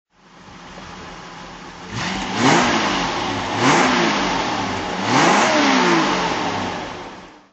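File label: jag xjc v12 throttle, Engine sound; Jaguar XJ 12 5.3 Litre Coupe, 1977; V3D/ ClassicarImages, Marc Vorgers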